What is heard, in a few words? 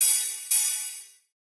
ride